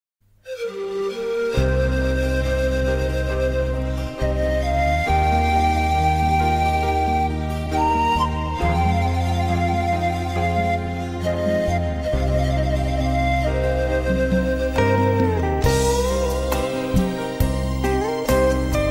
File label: Thai Music